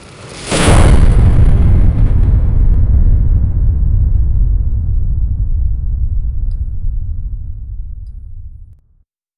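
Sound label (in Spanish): estallido súbito con sonido, fire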